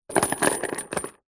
industrial chain medium sized set down on wood; zapsplat

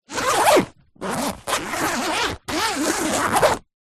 foley bag backpack zipper; zapsplat

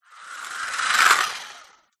leisure radio controlled car pass; zapsplat

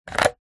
battery remove from plastic battery charger; foley

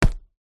footstep boot soldier single stamp

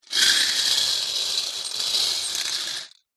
leisure toy bingo balls roll in plastic cage; zapsplat

